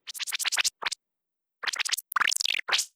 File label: blooper attack; tf2ware ultimate; mariokart